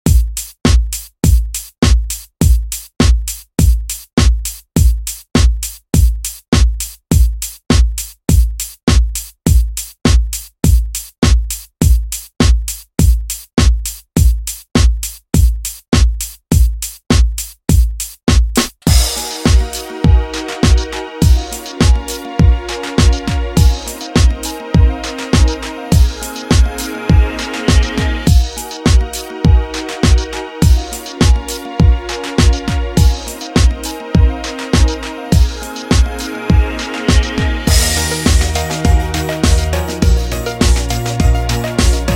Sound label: 80s Redrum, 102 Bpm